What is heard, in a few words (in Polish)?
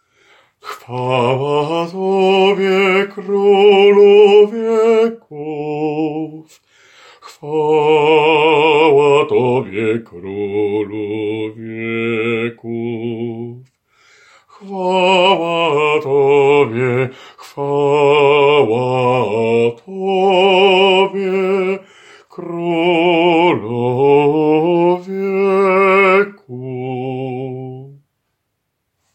Chwala Tobie BAS; Glowacki